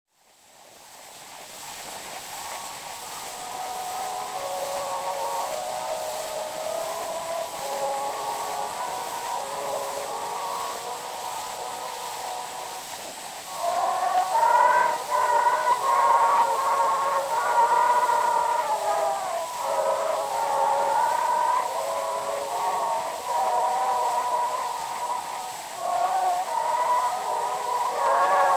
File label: Zulu Recordings; Father Franz Mayr; Marian Song